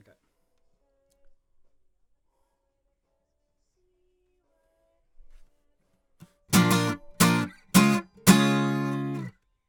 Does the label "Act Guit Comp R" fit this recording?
Yes